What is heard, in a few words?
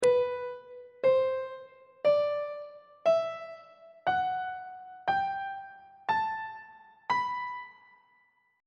b phrygian mode; on treble clef